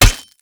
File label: Hammer Impact Flesh; Weapon Impacts; Player; Combat; Tuvalkane Hammer